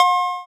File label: cowbell, drift phonk